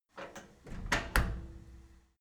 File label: cleaning machine close handle slow